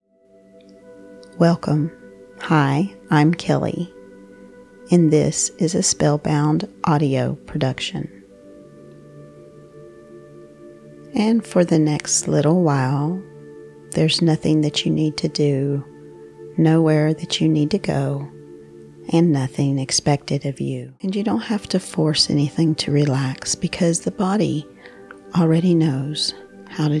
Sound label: calm series sample